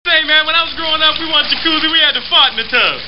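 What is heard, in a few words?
fart in tub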